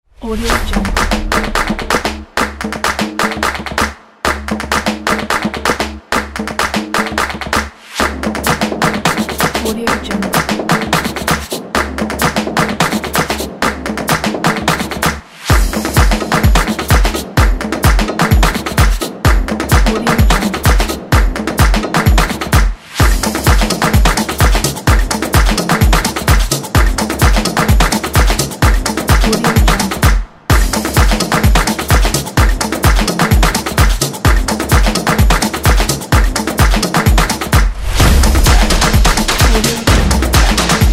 wild drums

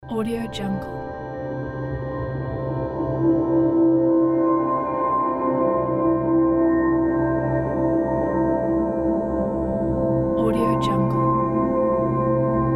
dream soundscape; greenalex

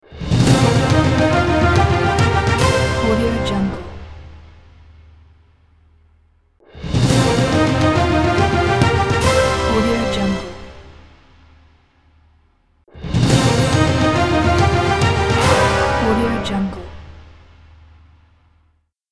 quiz show winning logo

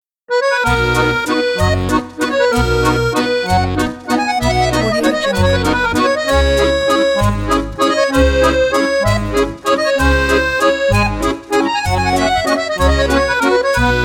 the accordion; face-to-keys

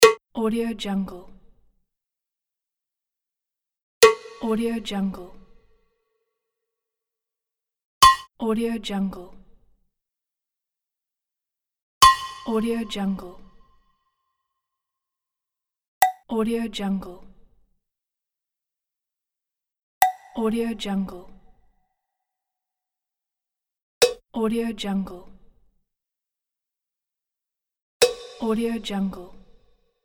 more cowbell

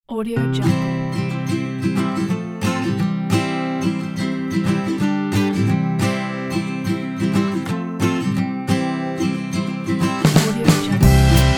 folk ukulele

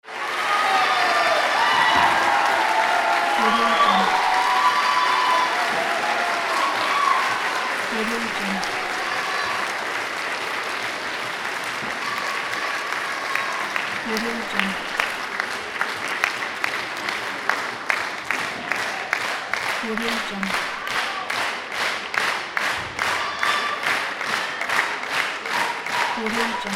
applause cheers

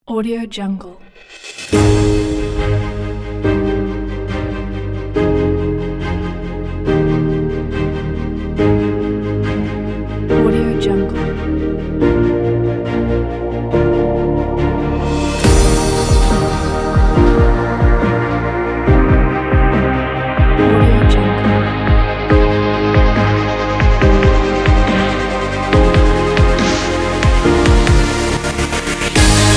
orchestra energy

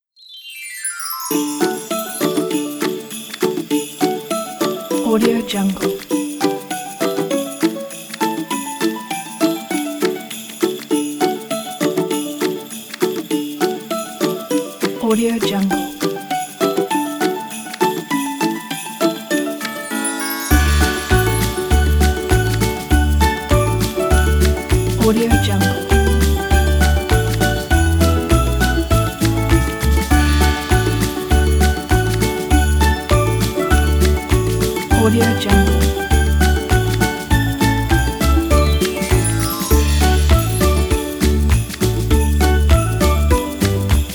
jingle bells fun game kit

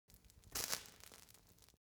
elements fire torch sizzle tiny

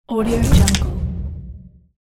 spell

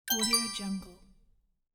message sent

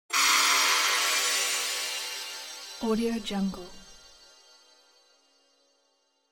magical

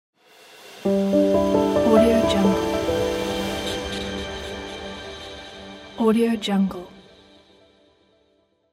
opening piano logo

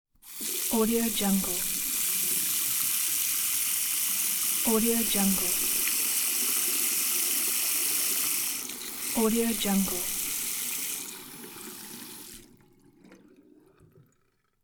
tap water; ferdi8

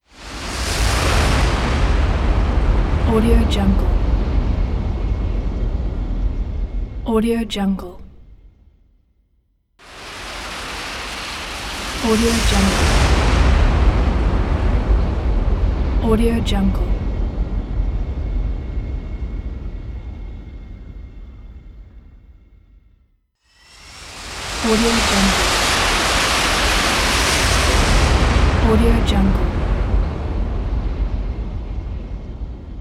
jet engine pack

